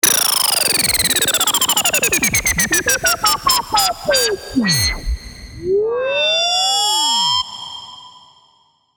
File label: Downlifter; SERUM FX